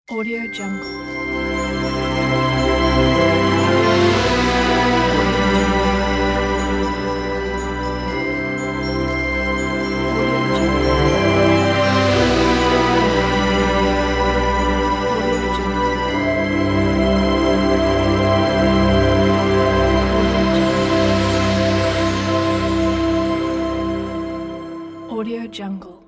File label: magical logo ident